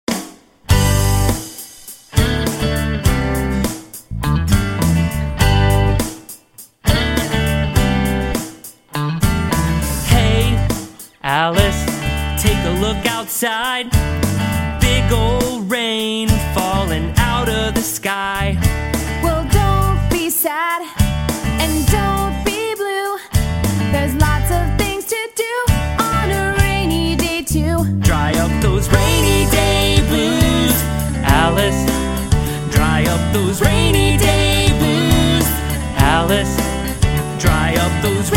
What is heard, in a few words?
Blues